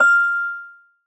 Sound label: soft hit whistle; osu!